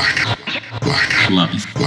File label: House Loops, Vocal Loops House 128BPM